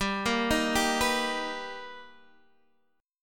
B Augmented Major 7th G, chord

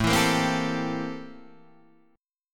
A-Minor 7th Flat 9th, chord; A-7b9 Chord {5 7 8 5 8 5} strummed

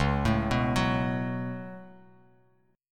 Db 7th Suspended 4th Sharp 5th Db down; JGuitar

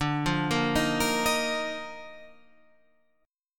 Eb Major 7th Suspended 2nd D

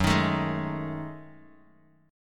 F sharp Minor 6th Add 9th, Bass Standard; strummed; JGuitar

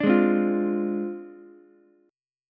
D Minor 7th Flat 5th Ukulele; Dm7b5; strummed

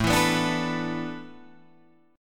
A Suspended 2nd Suspended 4th down Guitar Drop D

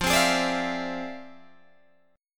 F Minor Major 13th, Guitar, DADGAD

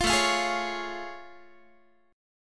A Augmented Major 7th A Guitar; A+M7 strummed; 20,17,20,18,17,x

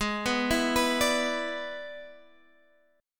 E 7th Ab, guitar

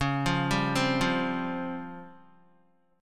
Db Major 7th Flat 5th Db down Guitar; arpeggiated

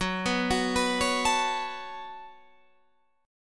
C sharp 7th Suspended 4th Sharp 5th F sharp

